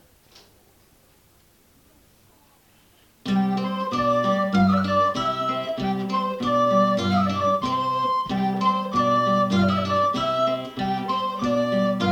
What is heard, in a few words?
Country dance